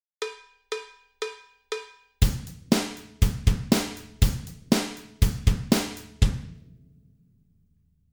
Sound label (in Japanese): kihon hh, drum